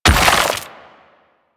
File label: Stone Gaze Freeze Finish; Warframe; Brawler